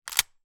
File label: gun model 12; still north media